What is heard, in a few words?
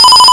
Arcade Riffs